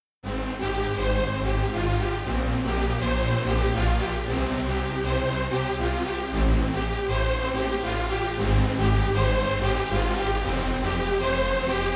Euro Synth; dexibell vivo s8

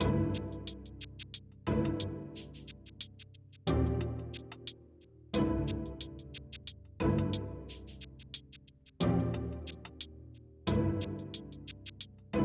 strum off

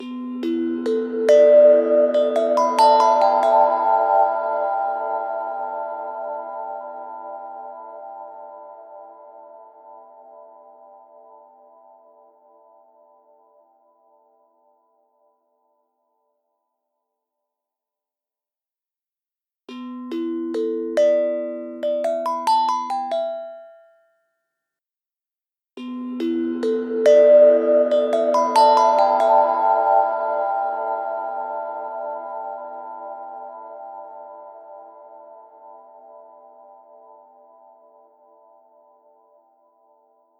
Cowbells; Glorious Caldera; Temperance Pro

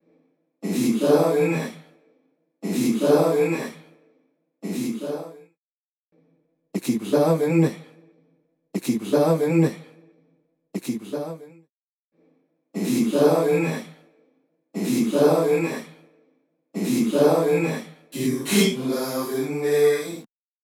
Disembodied Voice; Preset: Disembodied Voice; Physion Mk II

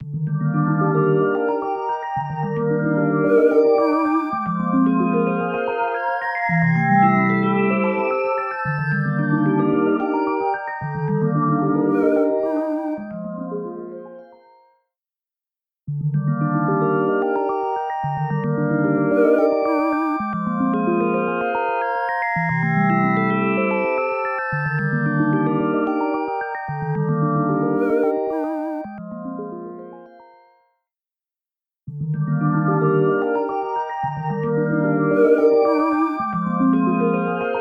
Poly Synth Resonant Flange Expander Stereo; MicroPitch Immersive

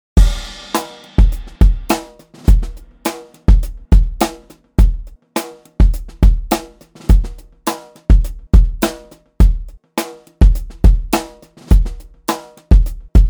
Dry

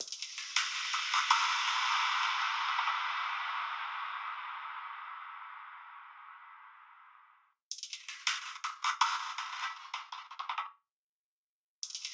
Glitch Percussion Lonely Satellite Binaural; Blackhole Immersive

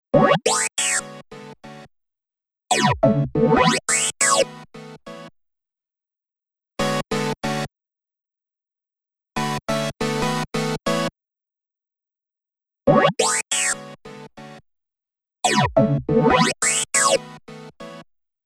Synth Poly guitar flange; H3000 BD Mk II

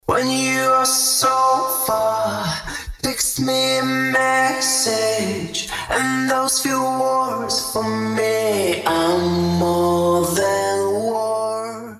Male Vocals Dynamic Vocal Slap; Tverb; Preset: Dynamic Vocal Slap

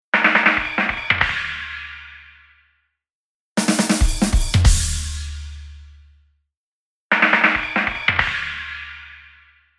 Drums TV IN THE NEXT ROOM; H3000 Factory Mk II; Preset: TV in the Next Room

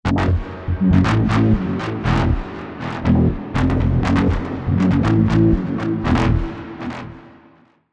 Filtered Bass Sequence; Preset: CC Filtered Bass Sequence; Generate; Newfangled Audio

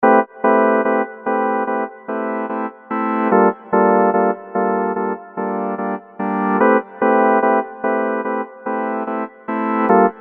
Spire Pad

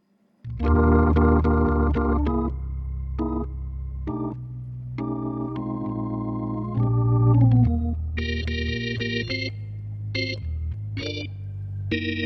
Jazz Ballad; dexibell vivo s2

